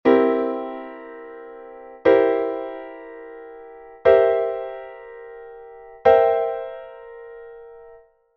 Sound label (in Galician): inversion cuatriada